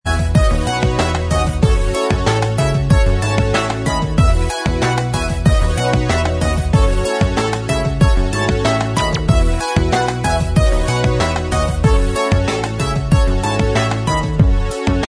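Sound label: instrumental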